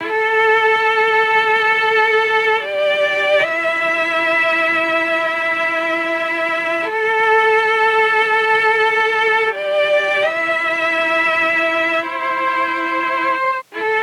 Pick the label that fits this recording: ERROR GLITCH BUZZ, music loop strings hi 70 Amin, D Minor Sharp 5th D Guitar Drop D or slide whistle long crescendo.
music loop strings hi 70 Amin